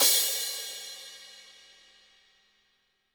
HiHat & Crash, Roland TD-20; HiHats

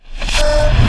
REV, SOUND EFFECT REVERSE

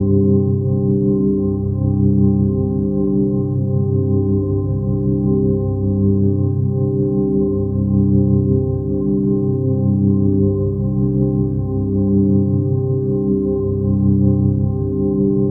Drone, keys; Sonic Foundry (Sony Creative Software); James Johnson Spektral Minimalism; Sublime Keys

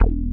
106 Bass E, One Shot Bass

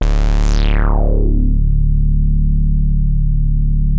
D2, Bass; E-MU Producer Series Vol. 6 – Analogia Project (CD 1); Boom da Bass 2

